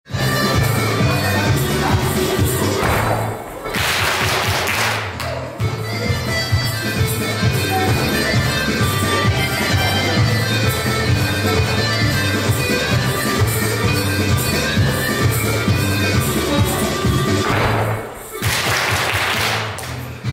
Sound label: damat halayi turkish folk dance